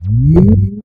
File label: teleport